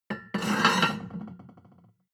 plate on plate place slide medium, restaurant cafe; sport-freizeit